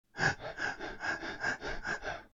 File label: panting; English Prep Package; Rex Does Tricks